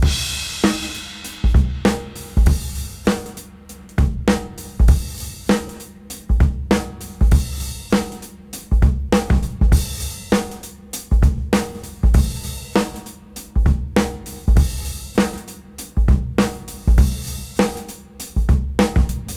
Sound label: Swing 99 BPM; AMG - Keith LeBlanc Phat Butt Jams